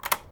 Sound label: Lock Pick Clic